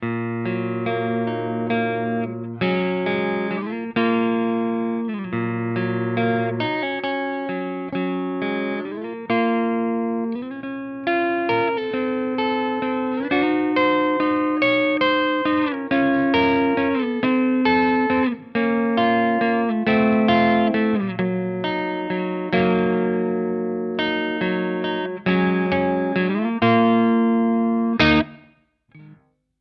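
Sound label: Neck pickup clean, guitar; Schecter Omen Elite 7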